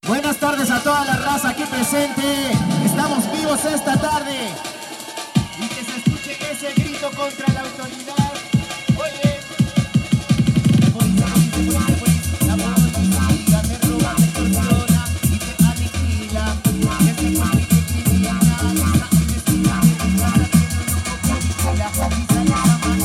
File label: sound system drum and bass rebelde